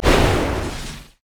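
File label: basic attack on hit; LoL; karthus; lich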